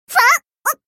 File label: clock